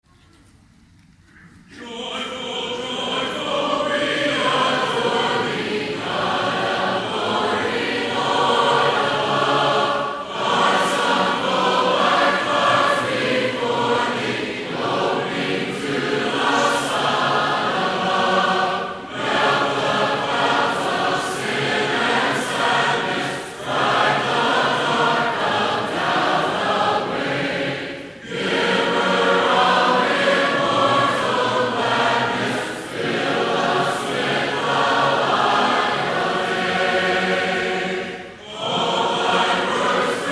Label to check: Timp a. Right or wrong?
Wrong